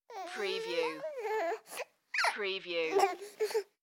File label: Baby Cry Whimper; sound effects bible